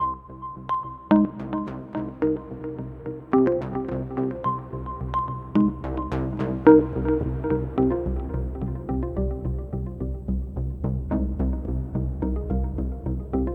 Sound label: Hacking Loops 108 Bpm